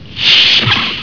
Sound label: trek door